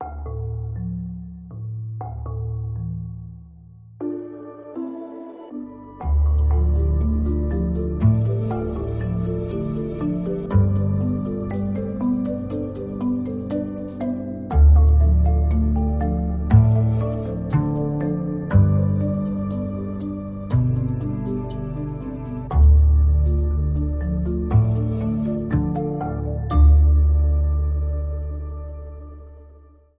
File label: Marimba Underscore